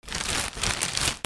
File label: PAPER